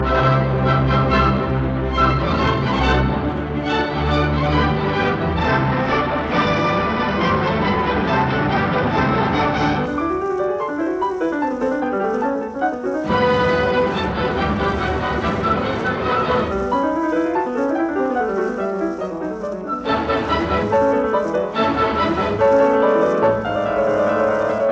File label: Piano Concerto in G major Rondo Vivace; Op 58